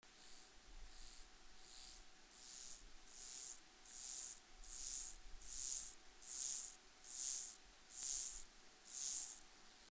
Myrmeleotettix maculatus, Ungarn

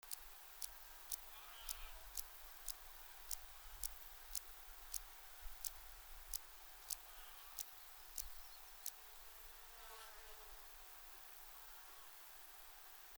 Dociostaurus brevicollis, Seewinkel

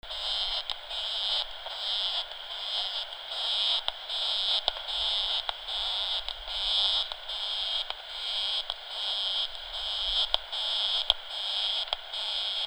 Isophya kraussii; Leithagebirge

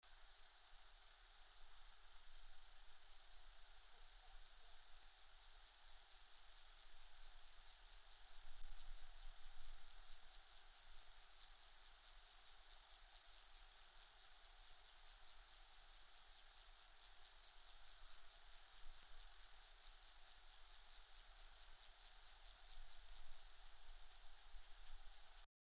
Stenobothrus eurasius, Hainburger Berge

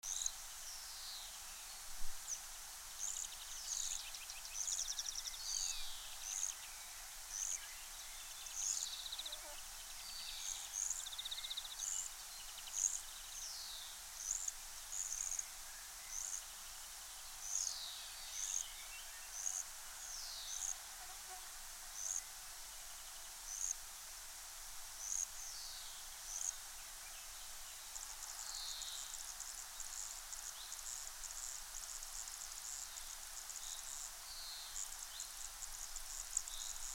Modicogryllus frontalis; SO-Steiermark

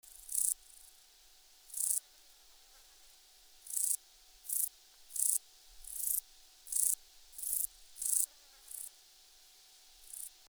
Euchorthippus pulvinatus, Ungarn

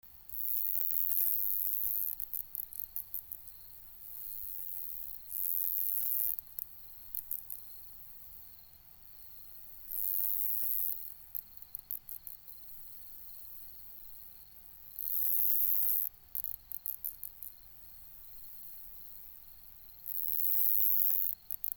Isophya costata; Thermenlinie

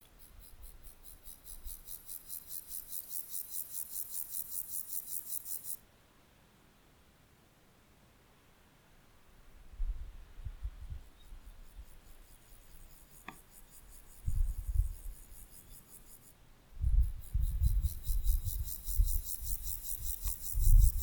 chorthippus rammei

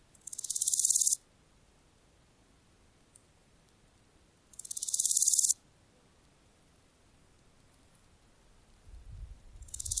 chrysochraon dispar

